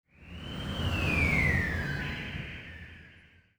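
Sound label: ambience pool; netent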